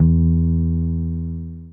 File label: Bass E